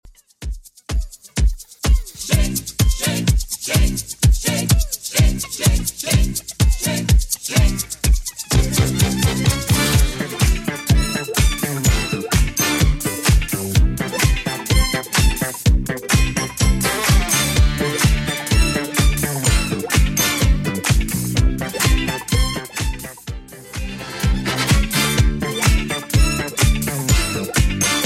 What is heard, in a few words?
70s Redrum 126bpm